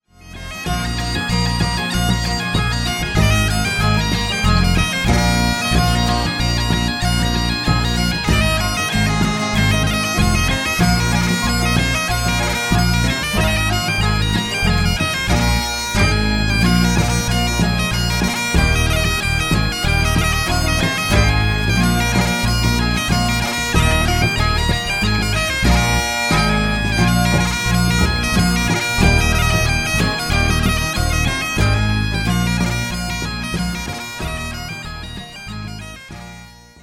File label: jig